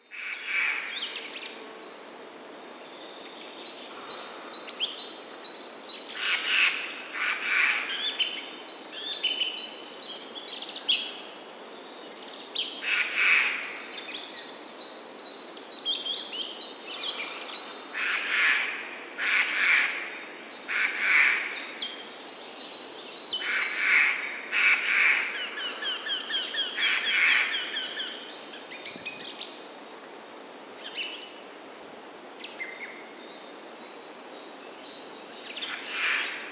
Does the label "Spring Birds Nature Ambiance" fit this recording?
Yes